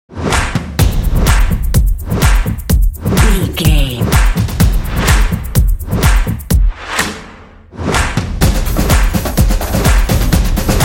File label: mighty impact